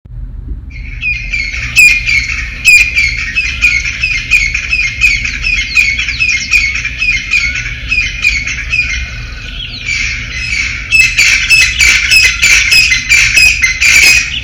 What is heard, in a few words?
Crested francolin